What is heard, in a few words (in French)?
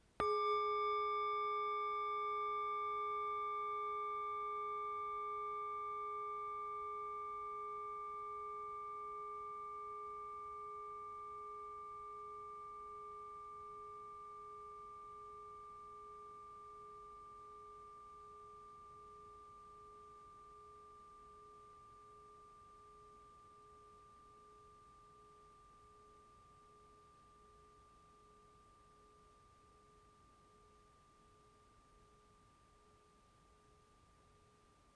bol chantant 105cm